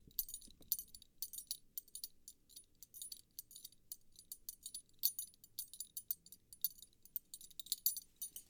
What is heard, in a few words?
Chains